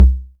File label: kick, Korg ER-1; Kit 18